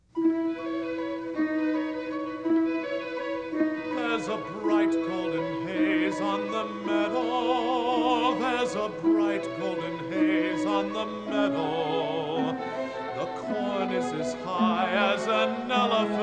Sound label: TENOR with vocal